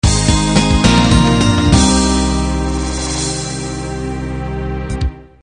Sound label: standard 8 beat end